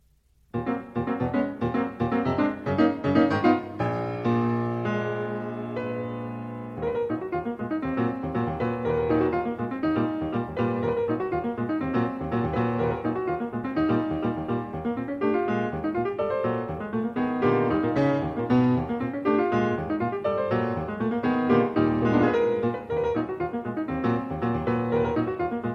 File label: Preludes for Piano Solo Allegro ben ritmato e deciso; George Gershwin